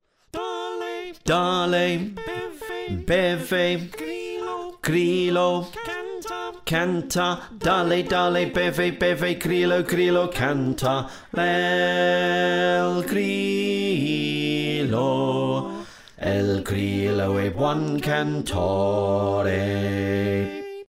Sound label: Slow Bass